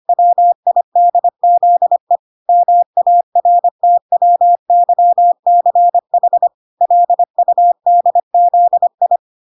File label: 25wpm